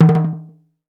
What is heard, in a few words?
NIGERIAN, African Perc